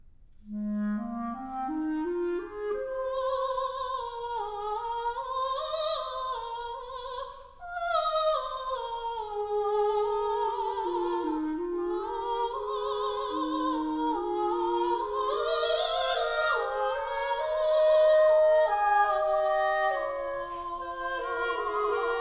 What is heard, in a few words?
vocalises quasi menuetto; three vocalises